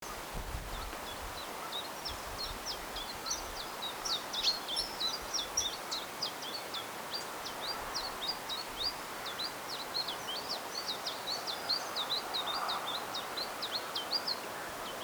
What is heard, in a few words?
mixed song, tristis; Coton